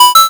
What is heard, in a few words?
coin; mario